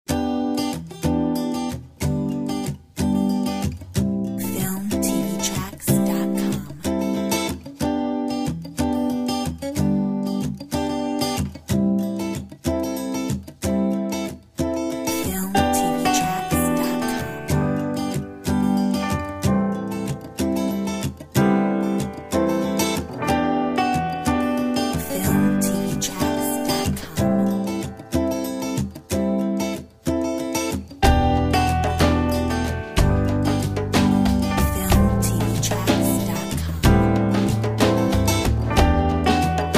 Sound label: Cafe Relax no vocal